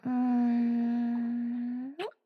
んー……ちゅ♡, ASMR; 早期特典 アラーム音

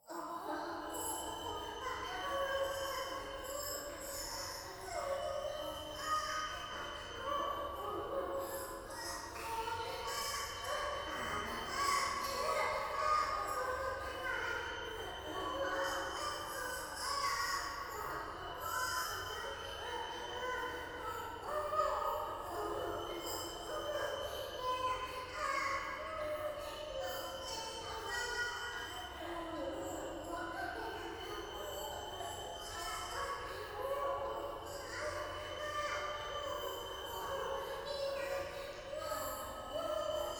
教会 低音喘ぎ 山奥

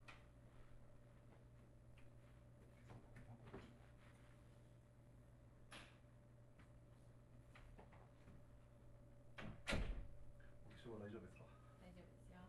冒頭フェラ; Audio Denoise

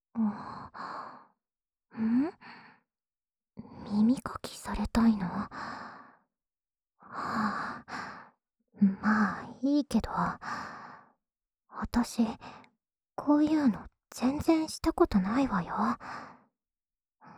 安眠用耳かきループ（右耳）

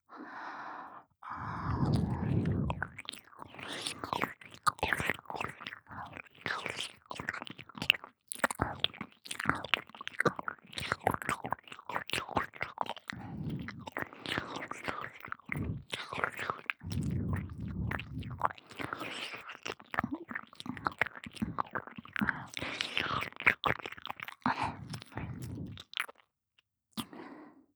左接近・耳舐め・激しめ; ミーシャ

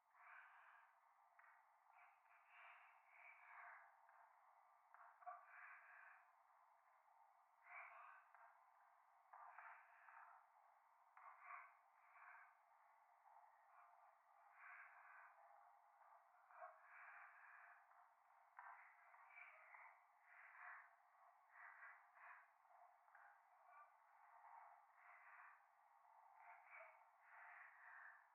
左耳 密着 処女 喘ぎ 電車; 夢の中

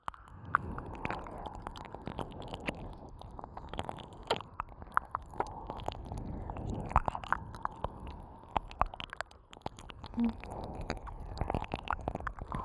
両耳舐めループ; 耳元オホ声、耳舐めループ; オナサポ

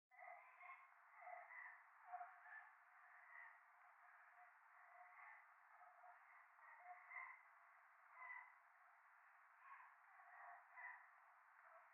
夢の中 喘ぎ 雨

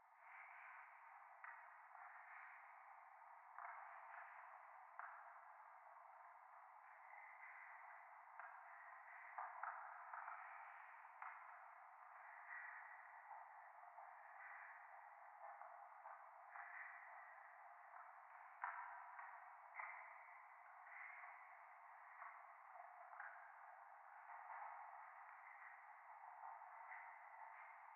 廃墟 左耳密着吐息 電車

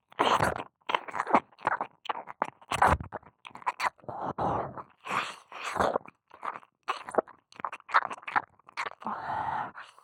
耳舐め・強め

